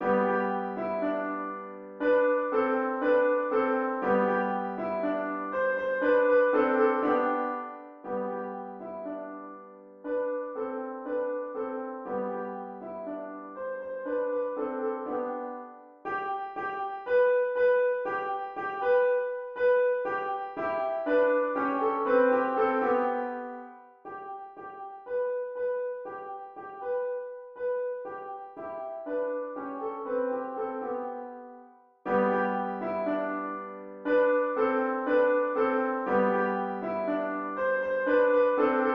piano

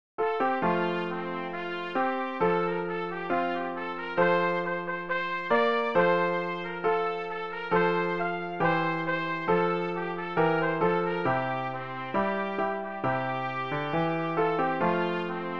clarinet

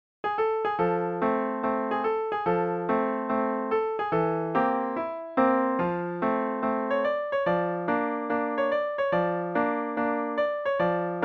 piano

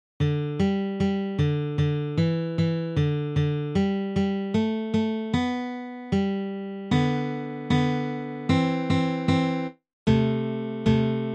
bingo guitar

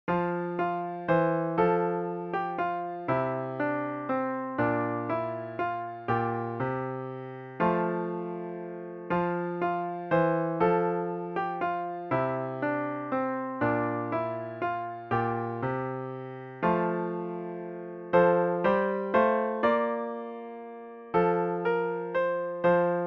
praise to the lord piano

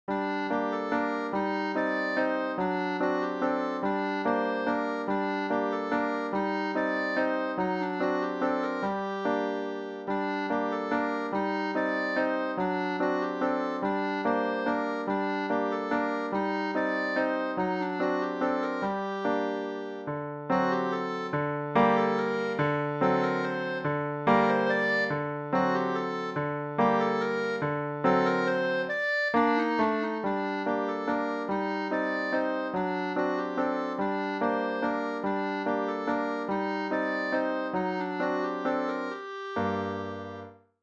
clarinet

